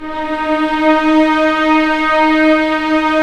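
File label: violins, piano, orchestra; Roland LCDP13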